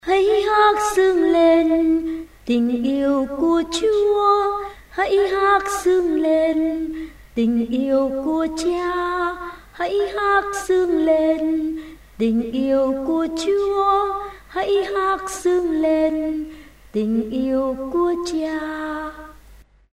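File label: soprano alto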